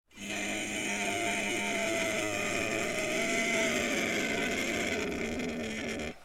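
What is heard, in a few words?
sounds of wheezing in a person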